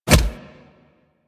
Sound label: Melee Impact Punch; Enemies; Purgatory Warrior; Warframe